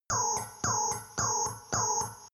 sci fi alarm door open

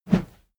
whoosh heavy low; swooshes-swishes

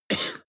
cough male single soft short; soundpool

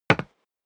step boots wood parquet single left; schritte

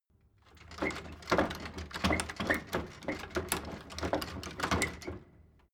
door flap loft rattle

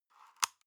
paper box small close soft; objekte

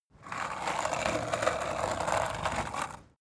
skateboard indoors drive light

